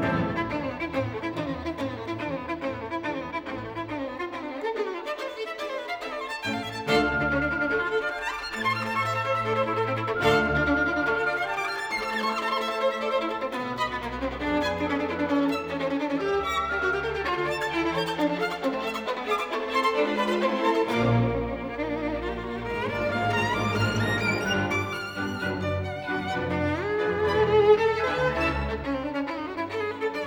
Violin Concerto No.5, Op.37 - III. Allegro con fuoco; Vieuxtemps